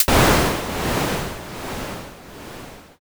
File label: thunder